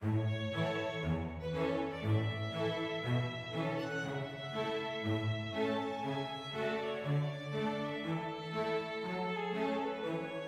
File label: happy med